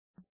silence; Skillzgaming; fruit Blast 92 Desktop

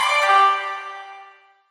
money summary end; medallion; Fantasma

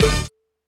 lock; astro Legends Lyra and Erion Desktop; Foxium

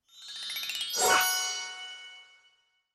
reel super star move start; riviera Star Desktop; Fantasma

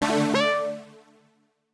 bubble intro; astro Legends Lyra and Erion Desktop; Foxium

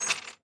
coin land; adelia The Fortune Wielder; Foxium

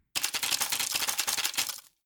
crossbow arrows appear; Skillzgaming; battle Mania

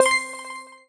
Count Up End; Lock A Luck

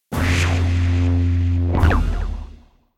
symbol freespins win; Rabcat; Classic 243

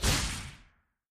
crossbow hits enemy; Skillzgaming; battle Mania Desktop

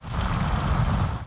shaking tiles; Skillzgaming; gems odyssey

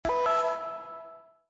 gamble win; Rabcat; Classic 243

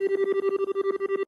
Base Tally Loop; halloween; Mahi Gaming

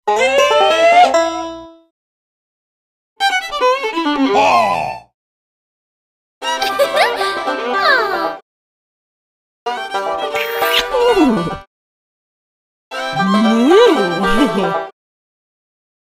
Win Sounds Symbols; oink Country Love Desktop; Mahi Gaming

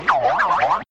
Symbol Animation Spin; Mirror Magic; genesis

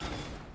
rollover; kaiju select; Recode; rampage riches Desktop

